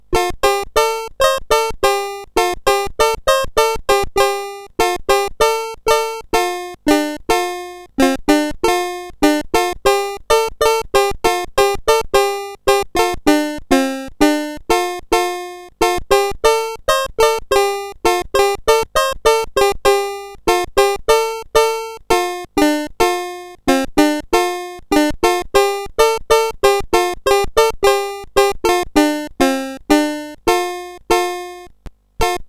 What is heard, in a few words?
BASIC, sid CSG8580R5; Yantzee